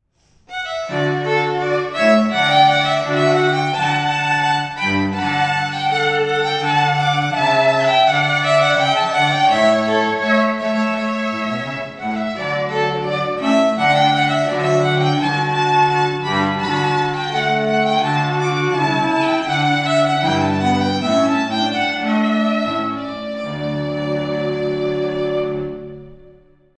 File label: strings; third floor strings